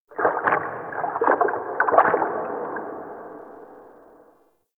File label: Foley Water Kick; Warframe; Tau; Cinematics; DarkRefractory